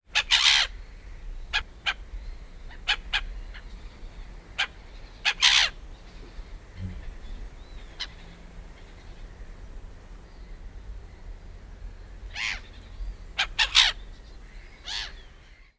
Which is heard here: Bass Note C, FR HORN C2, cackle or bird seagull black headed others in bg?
bird seagull black headed others in bg